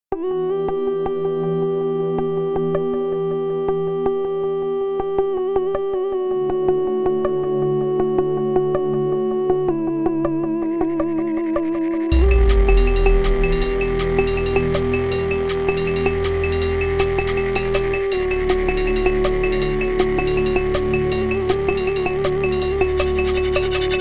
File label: dnb